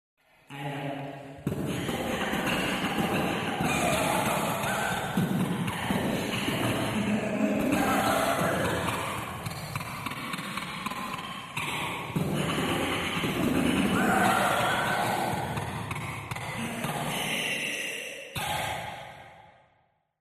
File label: pool